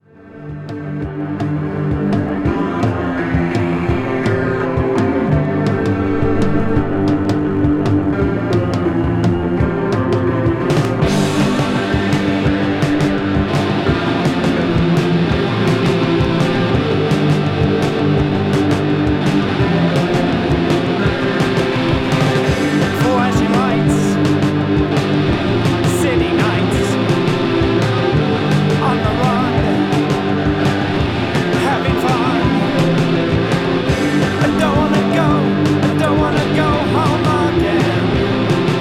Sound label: pop